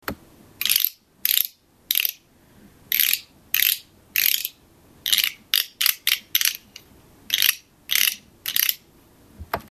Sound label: matu bokkuri guiro